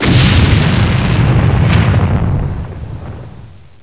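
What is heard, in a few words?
long explosion; quake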